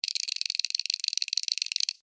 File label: Insect Run Cartoon, Insect, Run, Cartoon, Bug; Blastwave; Animals:Insects